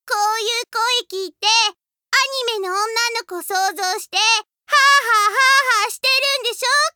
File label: haha